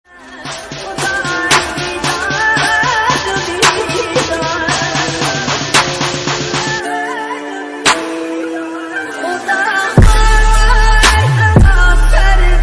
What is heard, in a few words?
kurdish trap remix